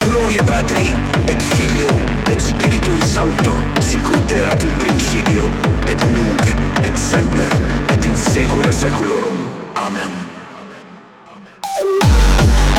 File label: gabber phonk beats with latin prayer intro